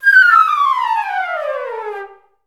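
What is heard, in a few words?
flute run down G4; Best Service; Peter Siedlaczek's Advanced Orchestra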